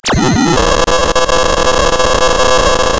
Sound of tearing paper, center

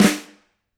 Flam

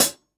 ClHat, drum

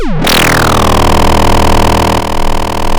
pop, chainsaw

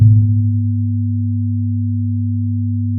pop, Sound of a church bell

